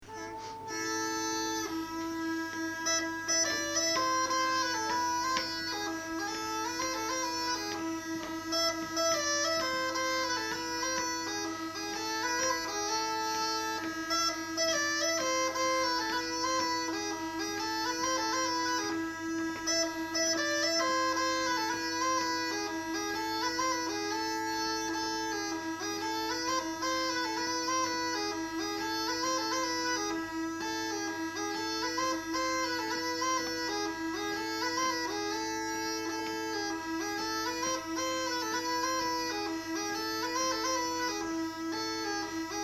Polska; efter Lissmyr Erik

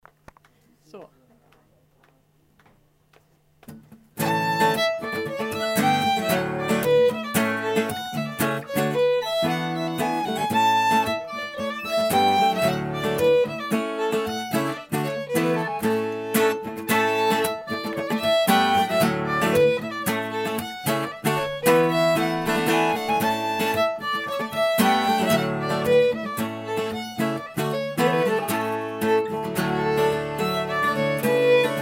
polska; Vanarim

